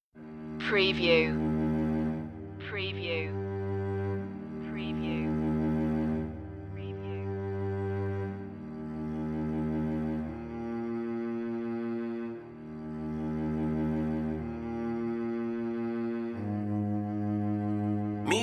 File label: almost a lullaby flutes violoncello